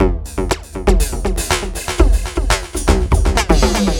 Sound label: ELECTRO SET; Bass; Sonic Foundry (Sony Creative Software); Not Just Another Pretty Bass